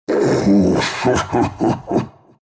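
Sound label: laugh, Russian; Ursa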